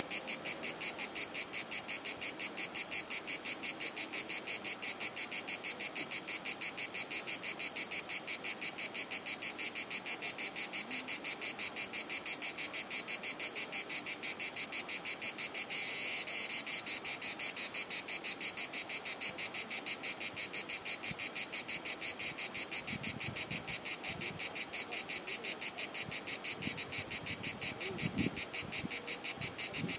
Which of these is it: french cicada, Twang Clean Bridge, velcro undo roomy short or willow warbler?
french cicada